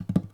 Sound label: Misc soft natural gtr loud